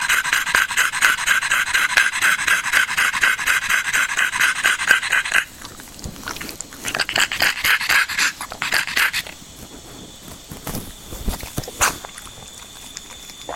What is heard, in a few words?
boas noise